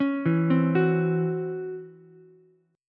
Db Minor Major 11th Db Ukulele C6; DbmM11 arpeggiated; JGuitar

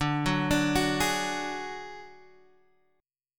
Gb Suspended 2nd Sharp 5th

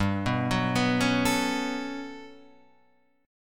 G Major Sharp 11th G, Guitar Standard; arpeggiated; JGuitar

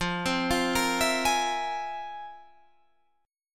F Minor Major 11th, Guitar, Drop D

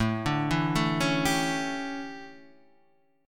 D Major 7th Suspended 2nd Suspended 4th, chord

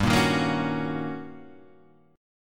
D sharp 7th Sharp 9th

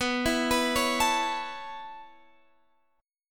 B 7th Suspended 2nd Suspended 4th, chord

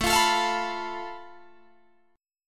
A Suspended 2nd Sharp 5th, Guitar, Drop D

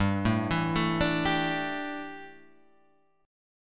D Suspended 2nd Suspended 4th G

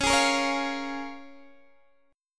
C sharp 6th C sharp down Guitar DADGAD

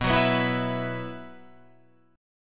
B Suspended 4th, Guitar, Open F; strummed; JGuitar

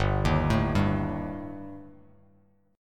G Suspended 2nd Sharp 5th, Bass Standard; arpeggiated; JGuitar

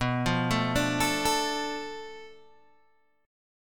B Minor 7th Flat 5th B, Guitar Open G

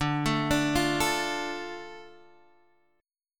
D Minor D, guitar; x,5,7,7,6,5; Dm arpeggiated

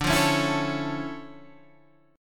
D Minor Major 9th, D, down, Guitar Standard; DmM9; strummed; JGuitar

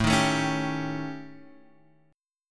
D sharp 7th Flat 5th, A

